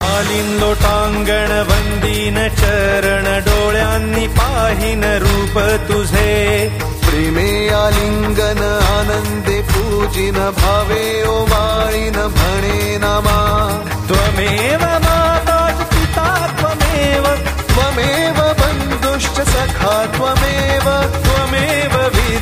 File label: Aarti; Morya